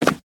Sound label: Concrete Jump; Devour